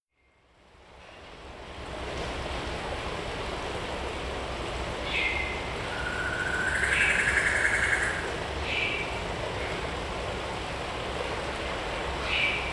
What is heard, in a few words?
Bear or lion roaring; KMP Film Video Sound Effects